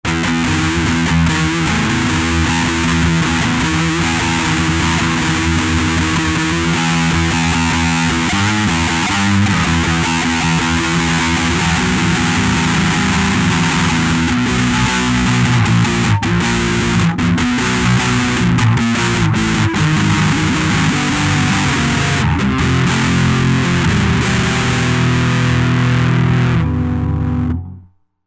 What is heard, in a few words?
WILD METAL II